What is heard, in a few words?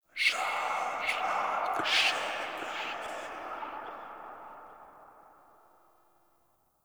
Room Whisper; Ambience; Dante Unbound; Warframe; Entrati; Dead End Secret